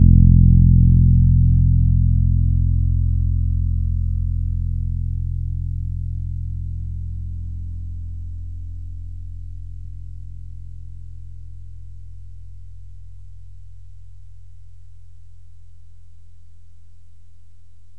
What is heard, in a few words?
D string low